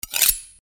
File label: Sword Sound; FXHome; Fighting Sounds